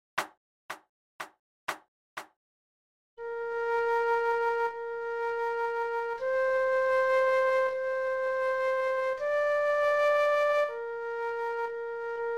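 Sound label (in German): Floete, 40 bpm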